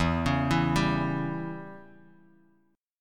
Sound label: E Minor 6th guitar chord, Open D